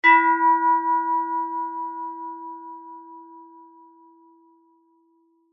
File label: bell medium strike mallet hand